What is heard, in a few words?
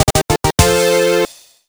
Minigame Fanfare; Nintendo - Kirby Squeak Squad; Video Game OSTs